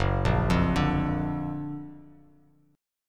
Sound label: F sharp Augmented Major 7th F sharp Bass Standard; arpeggiated